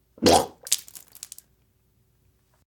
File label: puke on guy; sonniss gdc